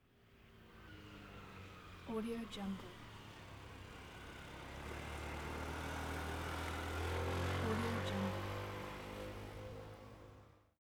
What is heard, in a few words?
passing scooter